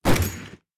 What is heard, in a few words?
Revolver, revolver single shot